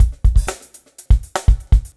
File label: Funky Drums, Funk Drums; R&B Funk Soul